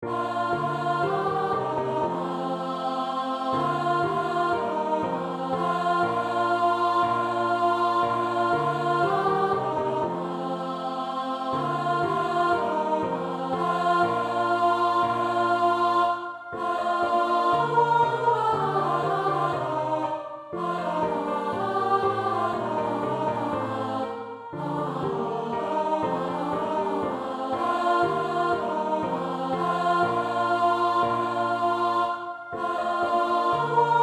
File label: Alto; Ding dong merrily on high